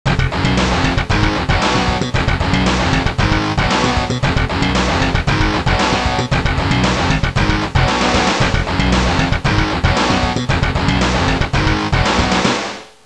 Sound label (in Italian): suonerie musica rock